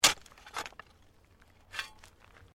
Digging Shovel Mulch

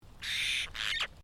Bird Apostle Bird Call; Various Apostle birds; Animals

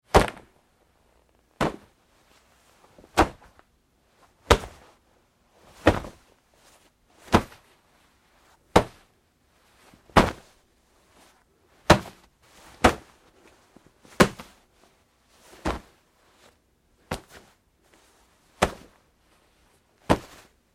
Foley, Fight Moves, Jeans, Grabs; Wrestling And Struggling

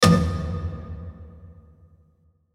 Hit Drums Tom, Hit Drums Tom Woodblock Reverb; Blastwave

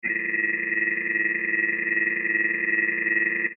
Scanner Beam Ray, Scifi

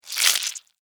Insect Squish, Guts, Footstep, On, Bug; Animal; Extermination; BlastWave

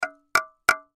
Hammer Nail Hit Wood; Hammer Nail Hit Wood Tap Move; Hammers; Blastwave

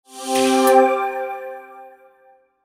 Logo Hiss Click Rhythm Shimmer; Sonic Logo Sound Effects; Production Element Imaging Element Accent Transition

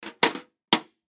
Cash Box Close; Money; Office; Blastwave

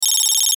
Satellite Zoom In; Multimedia; Blastwave

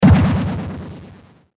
Hit Warble Rubber Kick; Warbles; Blastwave

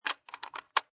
Rifle Bolt Action Insert Round; Insert round and rack; Gun Handling

